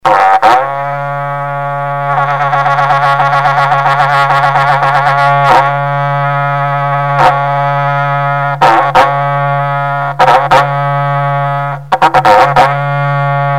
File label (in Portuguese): Toque Berrante; Rodeios; Esportes; saida